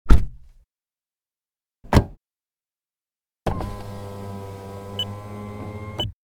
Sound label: Car interior foley door beep signal window